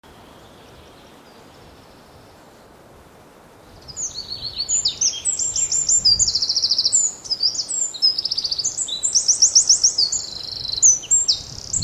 Stimme des Zaunkönigs

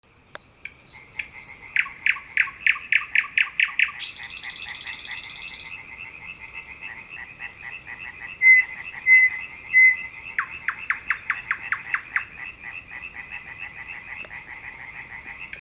Stimme des Sprossers

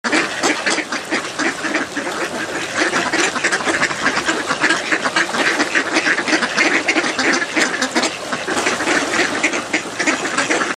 Stimme der Stockente

